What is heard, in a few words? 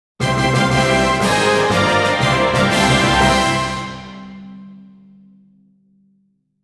Victory Fanfare (Short); Final Fantasy XIV - Before Meteor